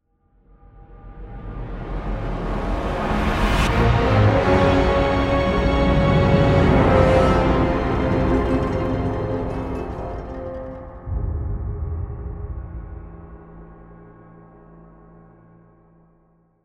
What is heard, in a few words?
lose